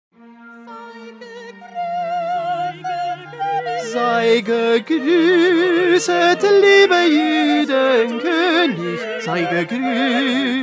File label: Tenor; Bach; St John Passion